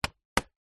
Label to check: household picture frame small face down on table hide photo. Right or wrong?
Right